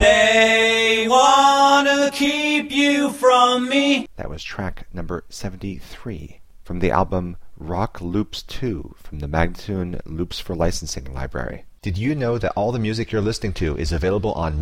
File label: A spoken, Rock Loops; spinecar; Loops For Licensing; MagnaLoops